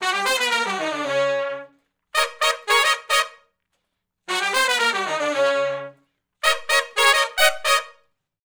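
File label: R&B Riff (Eb) unison, harmony, Horncraft; Sections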